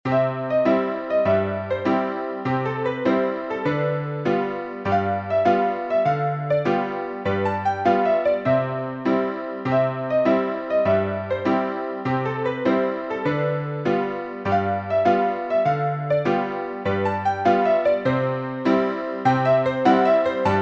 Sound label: piano, sheet music